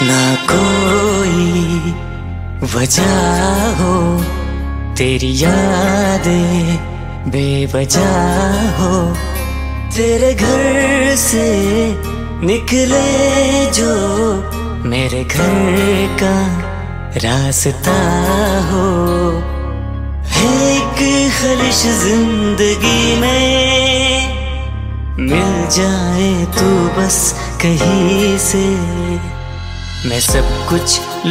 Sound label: Sad love Song